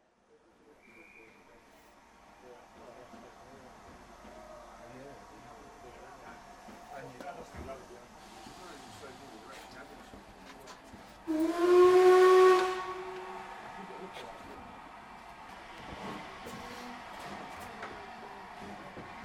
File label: Departing York ontrain